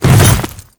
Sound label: Hammer Boss Step Ice, footsteps; Warframe; Grineer Hammer Boss